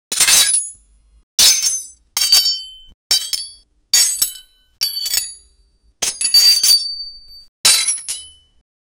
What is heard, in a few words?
swords clashing anime